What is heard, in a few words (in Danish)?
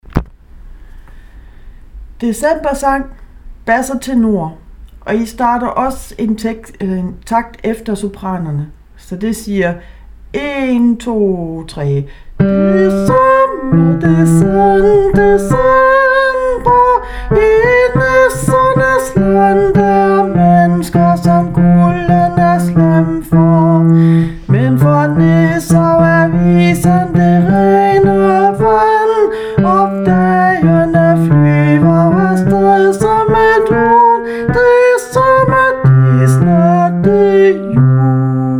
december bas og tenor